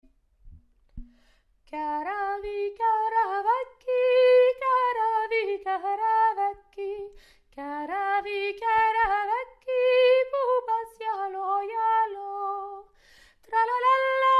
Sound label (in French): soprano